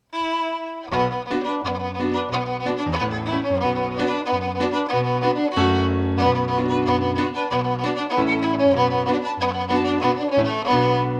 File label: Grouse in Gorse Reel